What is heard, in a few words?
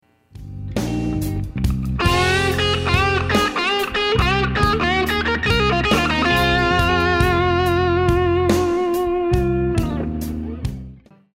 C Minor Blues Lick, guitar